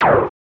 Laser

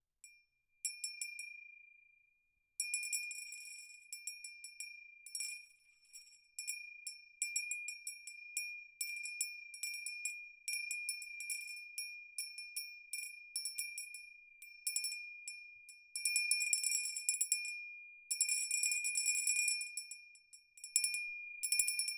Big Glass Bell Ringing

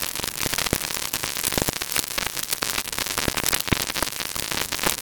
Crackle

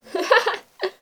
Girl Laughing Short

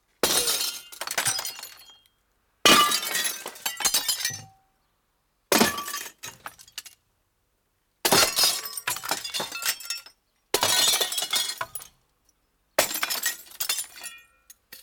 Breaking Windows With Stick; Ikkunoita Rikotaan Kepill

